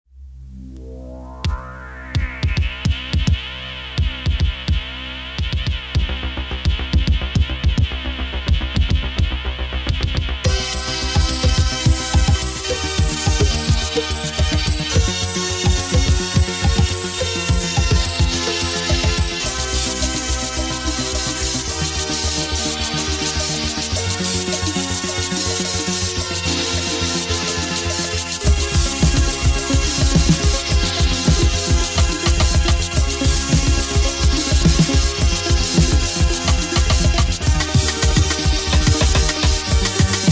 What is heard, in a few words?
Juno60